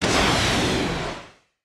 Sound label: rocket turret launcher; Factorio